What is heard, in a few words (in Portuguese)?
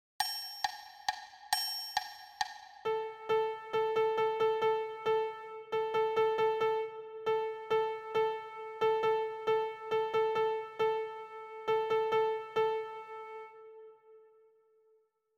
Ditado ritmico 6/8